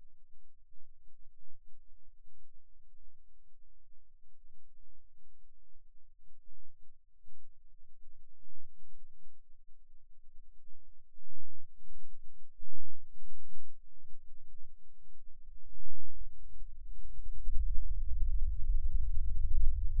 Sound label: Rhythm Seizure, eeg